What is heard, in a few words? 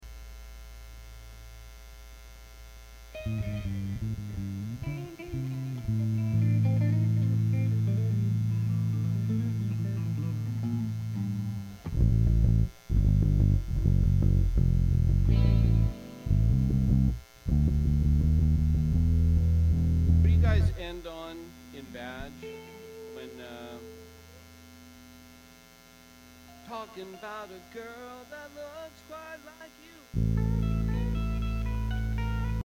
Buzz and Feedback Mix